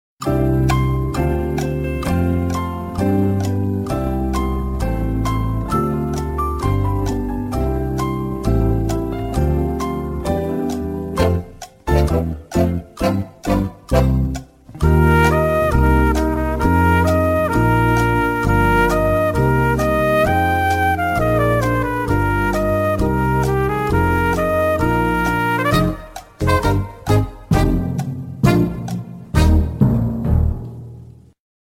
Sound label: 30 Second Timer With Thinking Music; Jeopardy